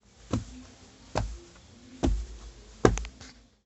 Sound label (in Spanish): Pasos tapete